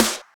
Claps & Snares

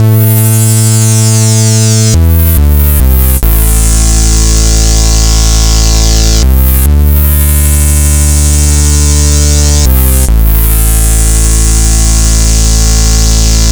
140 A BONUS MUSIC, Deep Dubstep; loop; Loopmasters; Jack Sparrow; Deep Dubstep Progressions

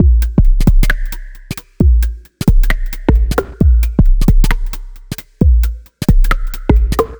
Bpm133 Break, dubstep drum loops; Moon Shade

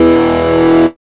HARD E2; dubstep; Jack Sparrow; Deep Dubstep Progressions

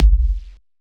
Kick

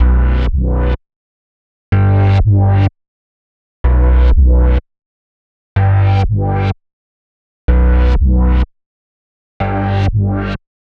125 Bm Bass, electro synths; Prime Loops; Complex Electro Synths; Precinct13

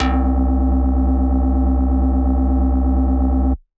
Dirty Dutch Lead C3

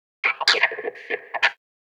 126 C MUSIC; funky; Loopmasters; Funky Underground Vol 2

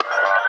D STAB, Funky Underground

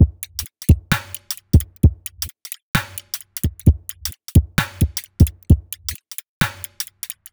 Bpm131 Break, Dubstep, Drum Loops; Apple Pie

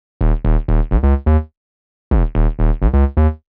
126 Eb C# BASS, Funky; Loopmasters; Funky Underground Vol 2; BASS LOOPS

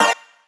Bb STAB; funky; Loopmasters; Funky Underground Vol 2

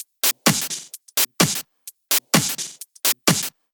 Loop, 128 BPM

